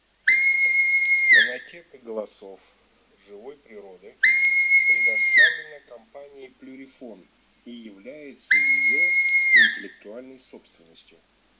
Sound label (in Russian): Косуля козленок Capriolo capretta; plurifon